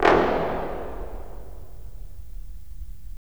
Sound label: BRS, Roland LA Composer